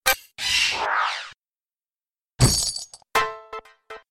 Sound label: Bonus Click; slots; Pirate Quest